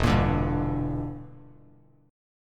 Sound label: E Minor 7th Flat 5th E down Bass Standard; strummed